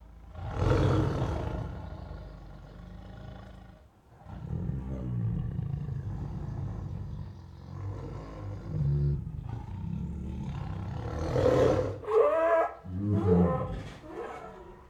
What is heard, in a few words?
Many Angry Hoarse Growls, Tigers; Sound Ideas